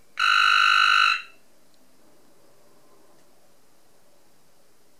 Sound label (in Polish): dzwonek